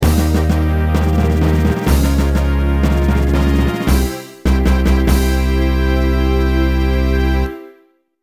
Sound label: Fanfare; Browser Fanfare; Ranger SOA